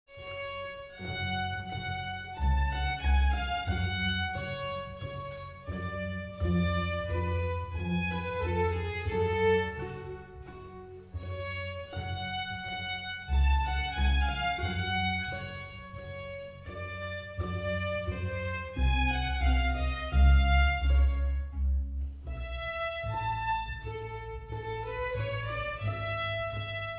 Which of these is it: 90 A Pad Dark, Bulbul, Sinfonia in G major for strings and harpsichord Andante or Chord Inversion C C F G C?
Sinfonia in G major for strings and harpsichord Andante